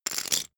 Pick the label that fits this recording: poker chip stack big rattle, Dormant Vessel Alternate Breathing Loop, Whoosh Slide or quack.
poker chip stack big rattle